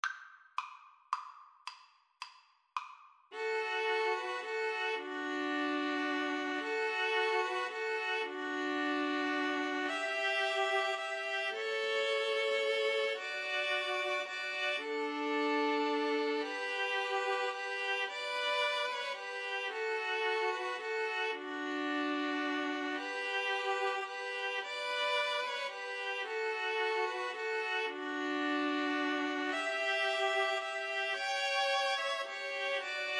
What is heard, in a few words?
silent night, String trio